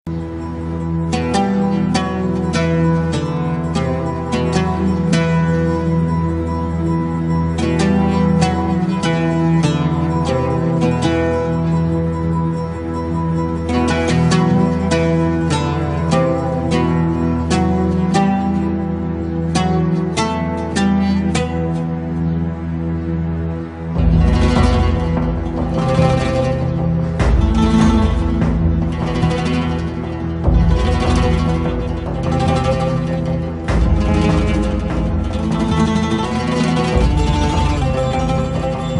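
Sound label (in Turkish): Dizi Müzigi Tema; Kuzey G ney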